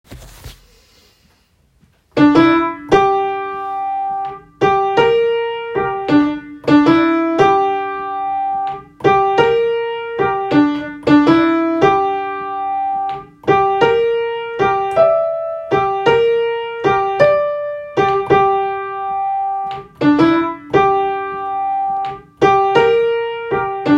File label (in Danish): melodi